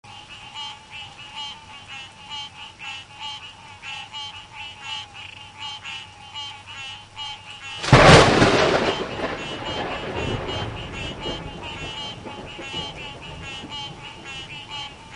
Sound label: Quieter Frogs